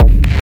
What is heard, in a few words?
analog crispy distorted techno one shot kick, Thumpy Distorted Techno kick, Berlin te; kick drum samples